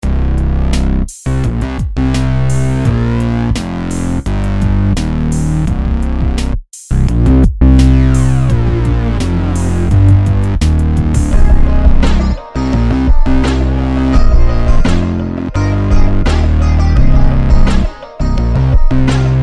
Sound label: Groove